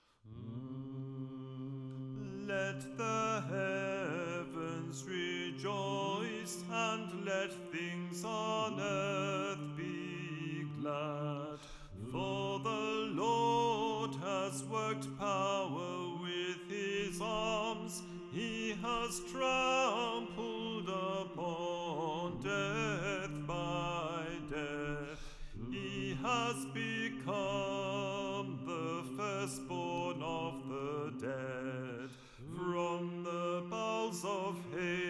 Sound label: Resurrectional Dismissal Hymn Third Mode